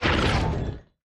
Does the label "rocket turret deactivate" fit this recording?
Yes